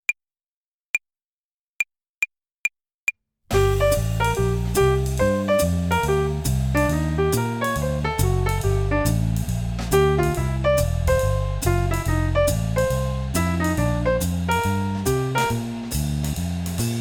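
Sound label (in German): Swing Phrasierung Übung; play along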